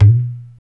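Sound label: percussion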